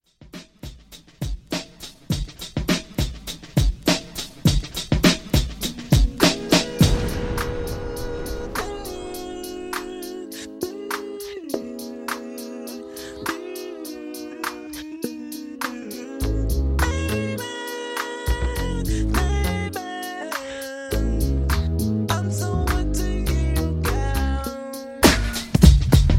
Extended Redrum

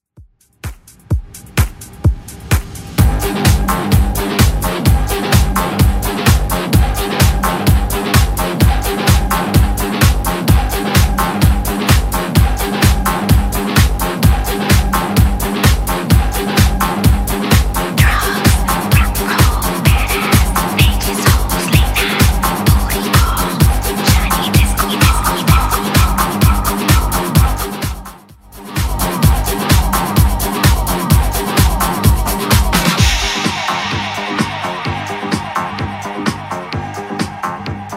128bpm

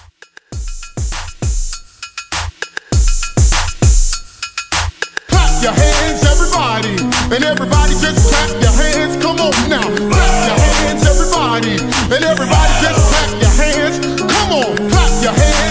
Hype Re Drum 100

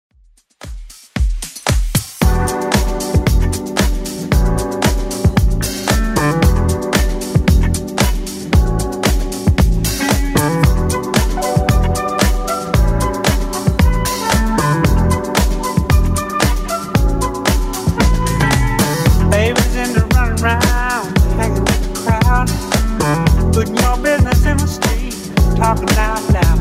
70s Club 114 bpm

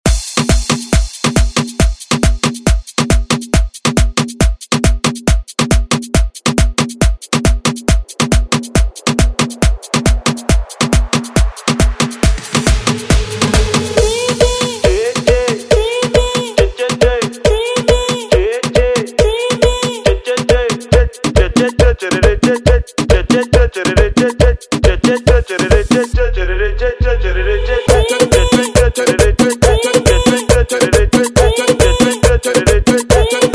Re Drum; DJ Mhark

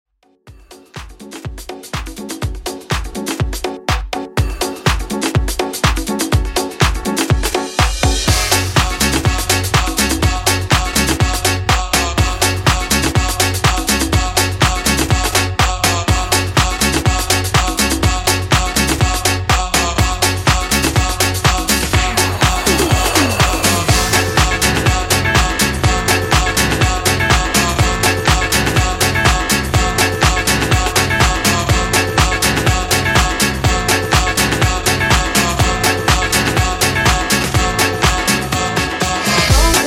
Handbag House 123 Bpm Clean

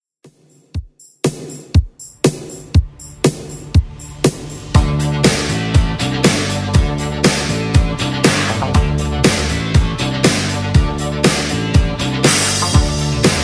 80s Redrum 120 bpm

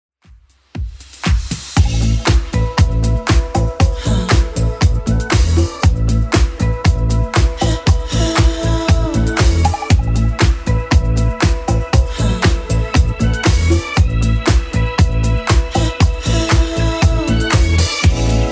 80s club 120 bpm clean; 80s Club Edit

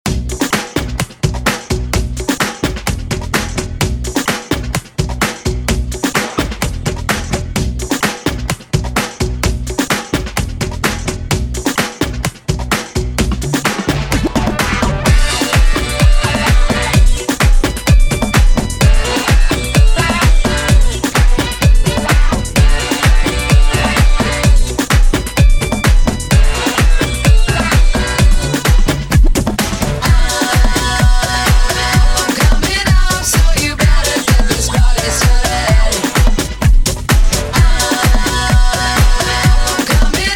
Latin Touch Redrum

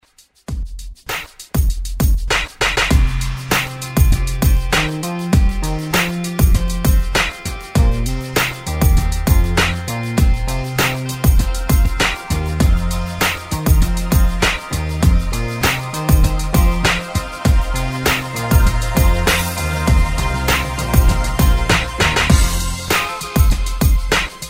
70s

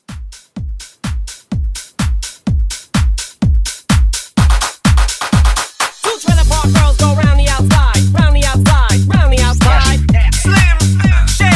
House Scratch Reboot 126

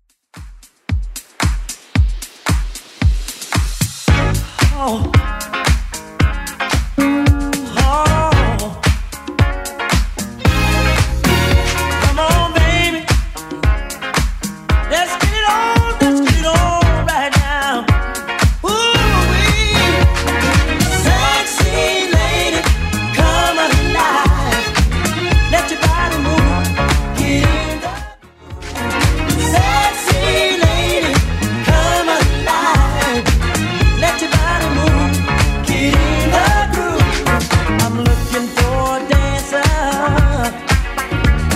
80s Club 113 bpm CLEAN

